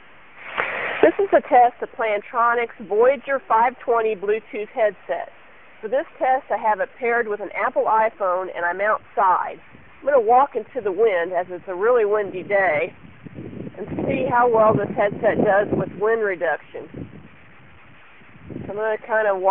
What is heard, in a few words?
outside; plantronics voyager520